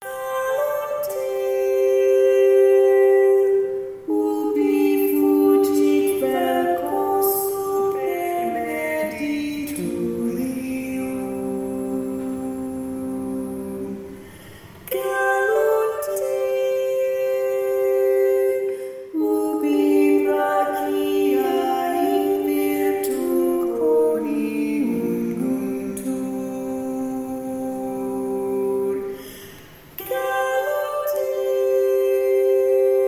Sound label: Ninth Century Parallel Organum